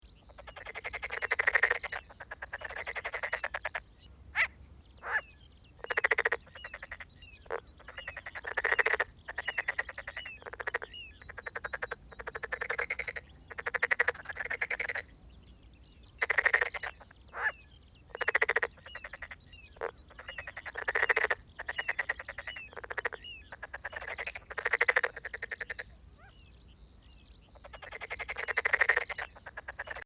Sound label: Pelophylax Porosus porosus Is Calling